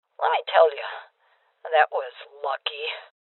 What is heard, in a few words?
Voices Armor Shot Female Old